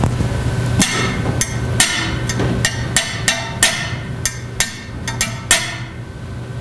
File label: taping glass